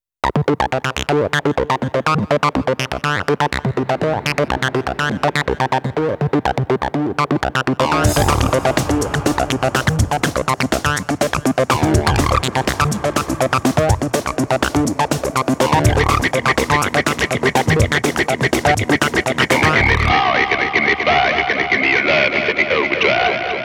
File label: rezosynth